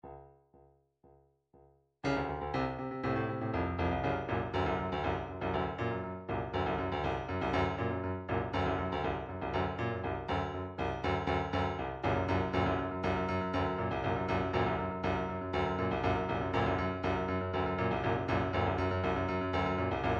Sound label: drum trax hard rock 120; Drumtrax Library 2